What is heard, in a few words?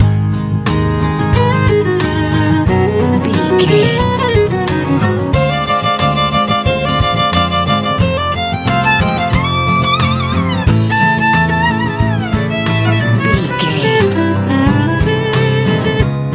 colorful easy pop